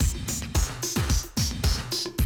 110, Classic Drum Loops

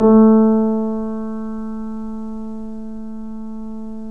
3D Pianos, Boesendorfer; E-MU Producer Series Vol. 5 – 3-D Audio Collection

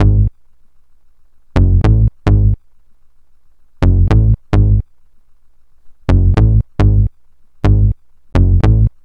Clipper Bass; Sonic Foundry (Sony Creative Software); Club Joints and Street Anthems; Lions Den

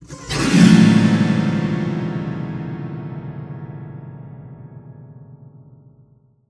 GLISS, SPINET; Xsample VOL-7

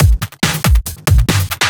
Break Beats, 140 BPM

